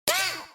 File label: scream